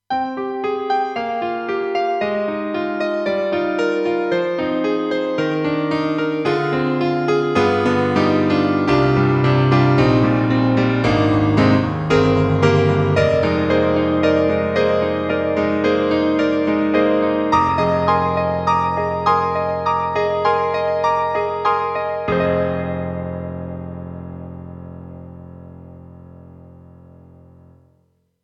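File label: grand piano, KAWAI GMega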